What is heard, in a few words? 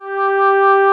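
analog pad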